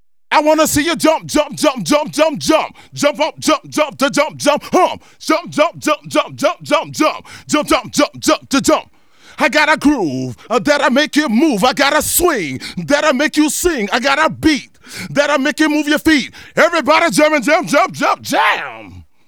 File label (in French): Choeurs & effets; L'Effet Pommier - Volume 04